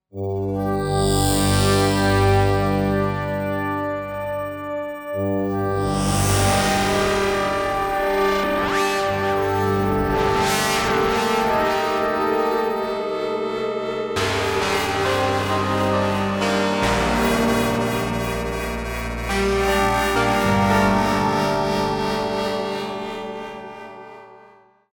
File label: Montage